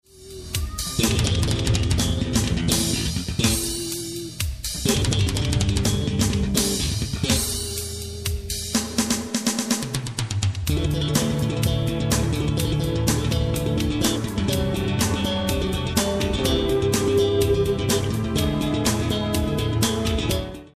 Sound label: bassline